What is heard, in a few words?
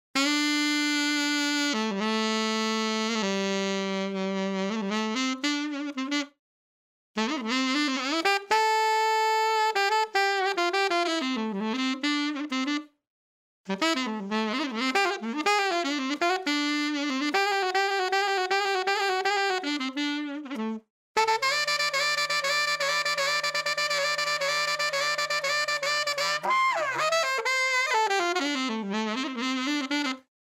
Sax Unmixed